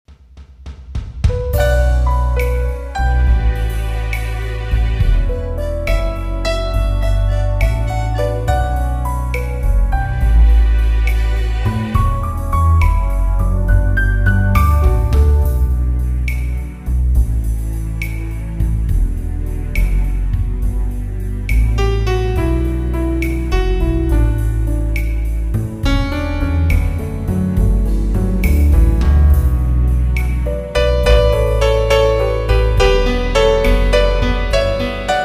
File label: Jazzy Noir